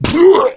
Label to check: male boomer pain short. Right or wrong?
Right